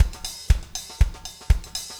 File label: 4 on floor 120, loops, 120bpm